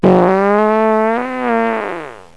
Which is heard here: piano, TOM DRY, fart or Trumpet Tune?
fart